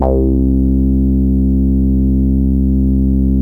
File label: ODY, ARP Odyssey